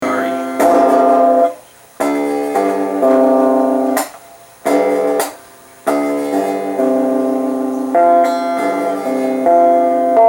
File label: Electric Guitar